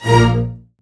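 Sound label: String Section; CMI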